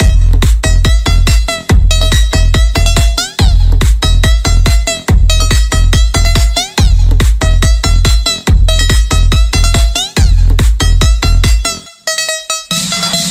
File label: Korean Dance